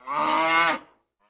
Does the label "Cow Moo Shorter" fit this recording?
Yes